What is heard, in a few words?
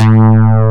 DIGITAL BASS, BassGuitar